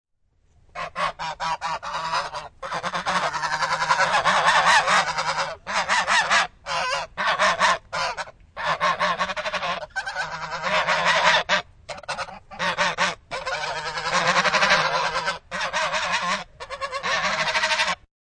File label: High quality stereo recording of three geese squawking and crying and creating; High quality stereo recording of three geese squawking and crying and creating a ruckus, up close; Gaggle of Geese